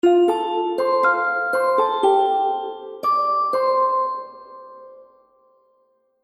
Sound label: Happy Baby Game Music; Cartoon Music